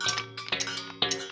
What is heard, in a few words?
building